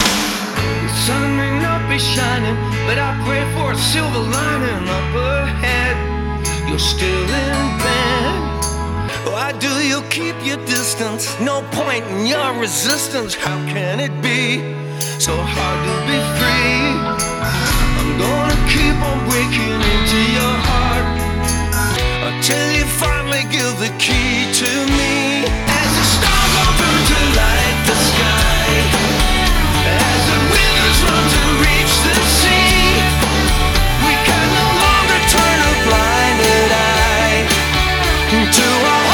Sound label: 3D Binaural; FM J10 ST 3D Comparison